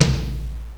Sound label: tom; hofclient